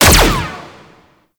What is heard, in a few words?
Stadium Hazard Laser Beam Start; Gameplay; Warframe; Nineteen Ninety Nine